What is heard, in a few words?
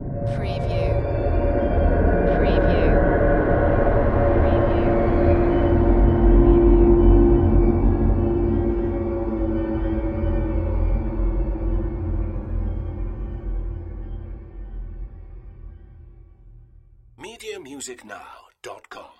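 Production Element Swell Eerie; Sound Effects Bible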